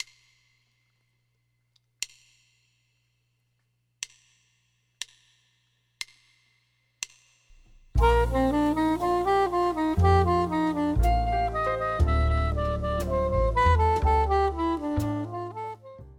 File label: 16 notes Bb Soprano; First Steps